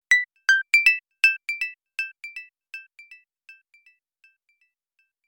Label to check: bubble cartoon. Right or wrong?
Wrong